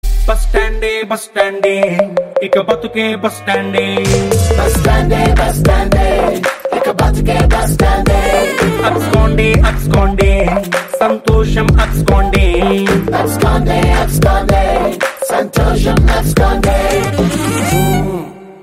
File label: BGM Ringtone